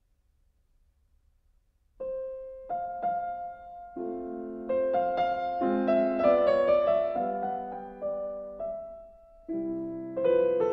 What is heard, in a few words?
Mazurka For Piano In C Major; Glinka